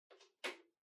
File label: Portable Stereo Far Earphones Plug In